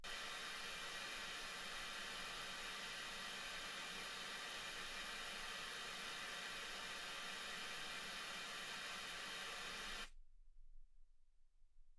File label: wgn